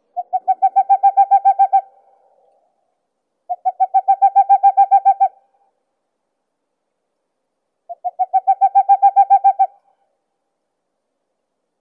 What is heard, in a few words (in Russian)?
sich mohnonogii aegolius funereus